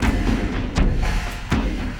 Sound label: Elektro Cym Swish, Drums; Sonic Foundry (Sony Creative Software); Friendly Fire; Marc Steve